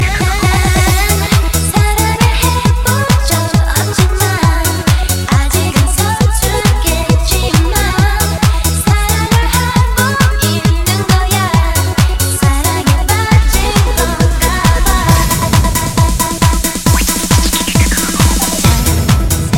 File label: Love trance